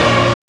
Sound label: SYN TECHN, dance synth; Sound & Vision - Gigapack I CD 2